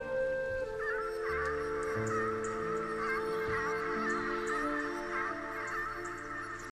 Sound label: birds; ori and the blind forest